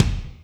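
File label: kick Big Foot RM, Alesis D4; 300 Drum Machines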